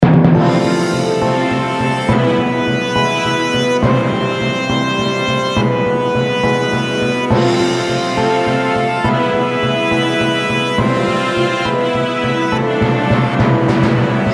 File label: presentation